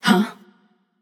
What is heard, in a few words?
confused passion; chaac